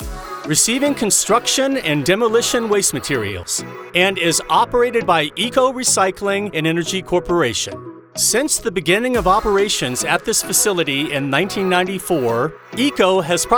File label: TOM N